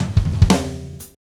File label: Gretsch groove 90 BPM, 1967 Gretsch Kit drums